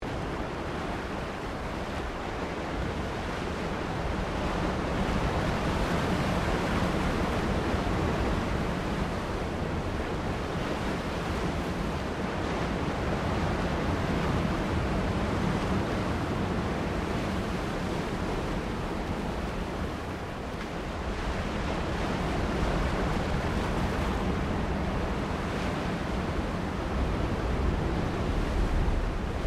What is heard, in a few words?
Water Ocean Waves On Sand Loop; Elemental weather effects; Nature